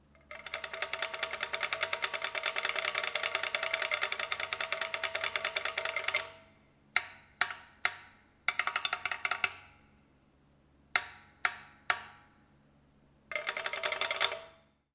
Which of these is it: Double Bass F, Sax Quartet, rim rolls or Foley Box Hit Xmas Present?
rim rolls